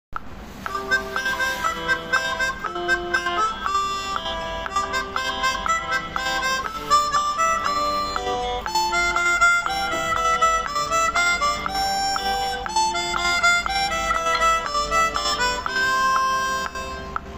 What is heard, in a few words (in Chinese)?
练习曲片段, 半音阶口琴